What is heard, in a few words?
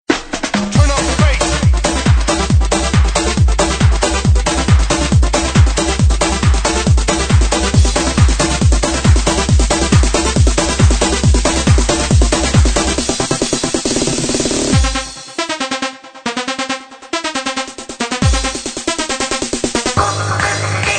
Turn Up The Bass